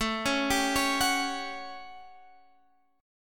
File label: A 13th A down, chord; A13 arpeggiated